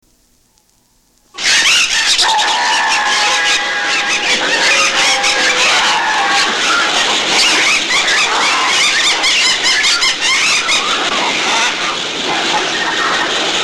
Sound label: Birds; Disney